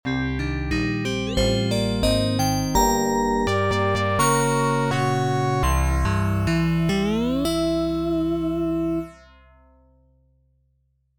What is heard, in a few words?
Game over; Gryzor87